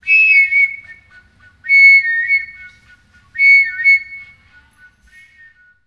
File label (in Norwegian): plasttoner